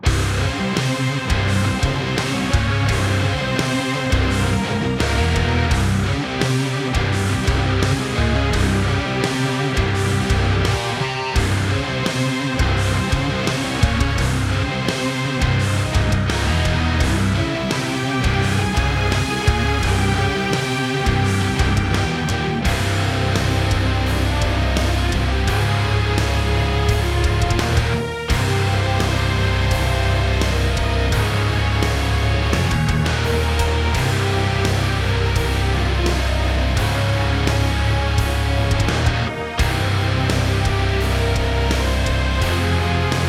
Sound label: Orchestral Rock Outmatched; Orchestral Rock Vol 2